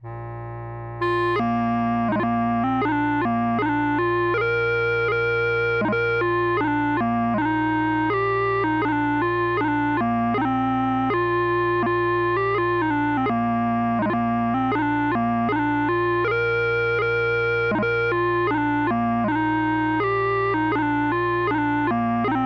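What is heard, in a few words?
Scotland, Wings, Scotland, pipes, 84 bpm; 4/4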